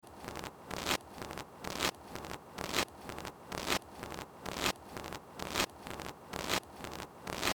Techno beat with field recordings bit crushed leaves